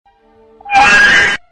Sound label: scream creature; tbh